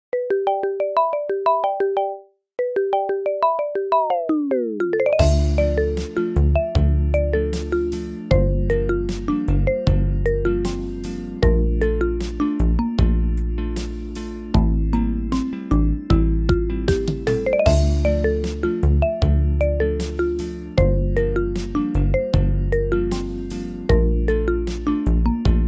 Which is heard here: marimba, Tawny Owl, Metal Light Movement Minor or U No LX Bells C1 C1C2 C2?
marimba